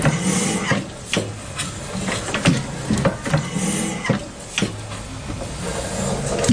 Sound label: blackstone oil engine loop, 1909 10HP Blackstone Oil Engine; DRUM LOOPS and BREAKS